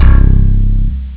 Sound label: slap, synth chords